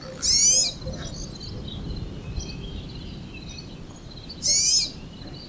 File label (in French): serin cini, orn